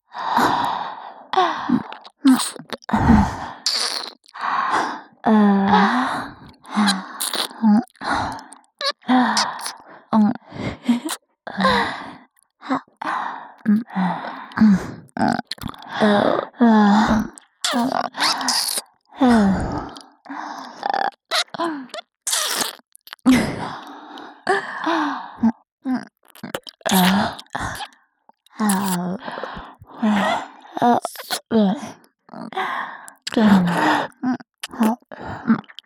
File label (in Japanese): 瀬里奈の両耳舐め ループ, ASMR